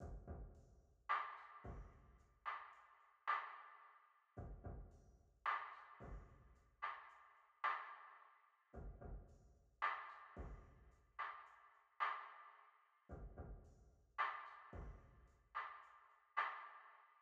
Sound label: Drums, 110 BPM D# Min; Cymatics; Oxytocine